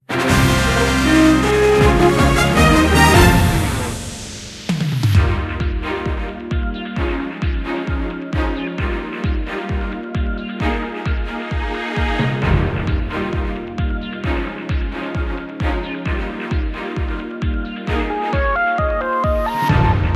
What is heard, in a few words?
Normal Battle Victory Jingle 158 4-4